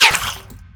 impact, violence; Benny Placeholders

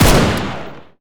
fire, m16 weapons; Urban-Coalition; Decay; arccw ud

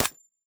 mech, m16 weapons

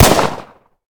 fire, glock; Urban-Coalition; Decay; arccw ud

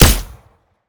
sup, usp; weapons; Benny Placeholders

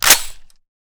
bolt drop, weapons m16; Urban-Coalition; Decay; arccw ud

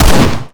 fire, glock; Urban-Coalition; Decay; arccw ud

